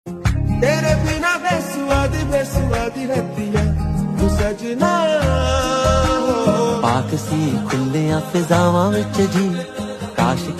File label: Ringtone